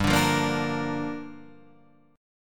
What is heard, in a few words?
G Minor 7th; Gm7; strummed; 3,5,3,3,3,6